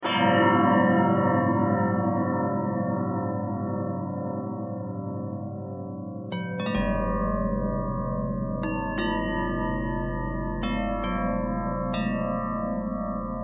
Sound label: bell twist